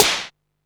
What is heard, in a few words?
snare, techno 138 BPM